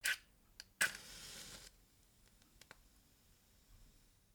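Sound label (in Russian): зажигания спички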